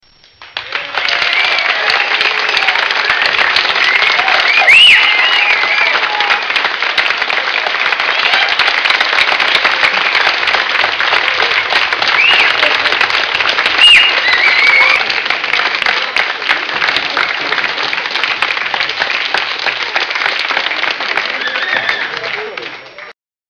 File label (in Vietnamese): Tieng vo tay huyt sao